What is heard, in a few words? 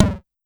Pollard Syndrum